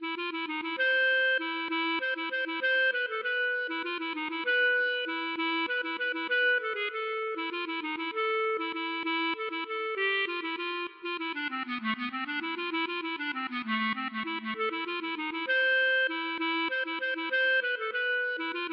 valse

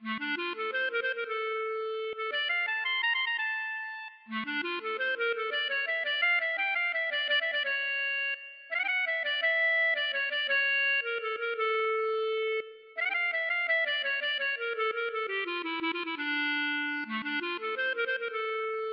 valse

